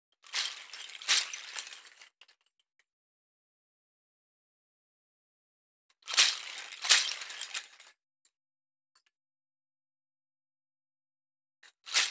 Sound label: Big shaker; Crow Hop (Weemmarategwa)